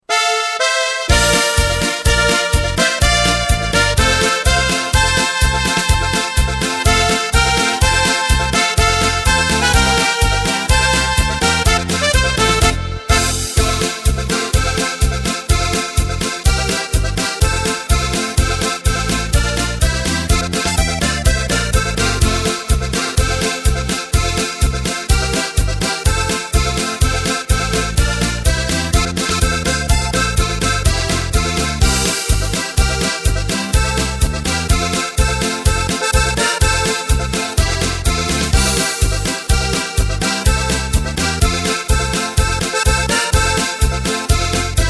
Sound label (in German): Drums Playback; midifile